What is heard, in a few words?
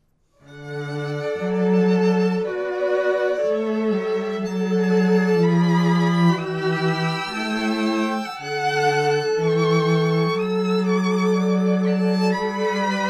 quintet